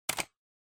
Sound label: PC Mouse